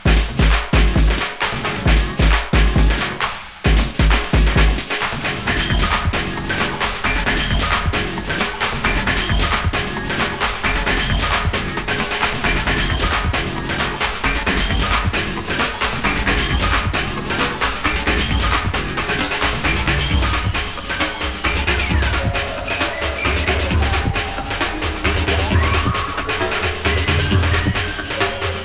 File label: Drums